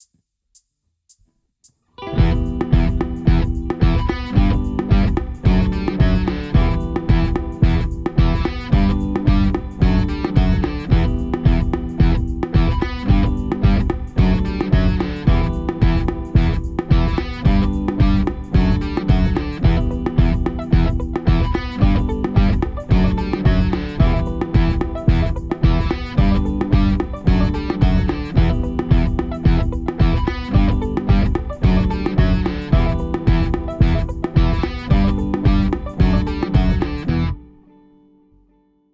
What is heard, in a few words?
Riff